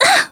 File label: damage; MonsterHunter; Fight